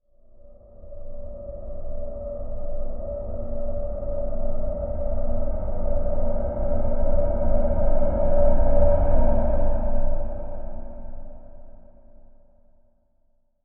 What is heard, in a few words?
SWELL DARK AIR, Swell Dark Airy; Production Element Imaging Element Accent Transition